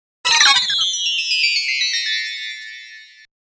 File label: Musical Descending Chimes Warble; Musical Sound Design; 5.1 STEREO MIX; Blastwave